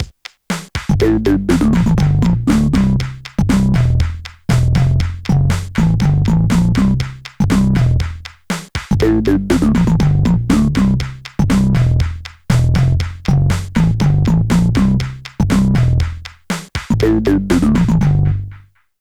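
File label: BASS with Drums; DIGI NODE BASS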